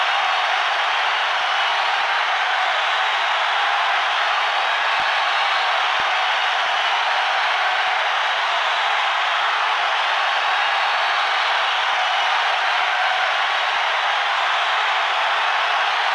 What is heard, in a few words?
Bustle Soundscape